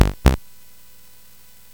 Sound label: Ducks Ahoy, CSG8580R5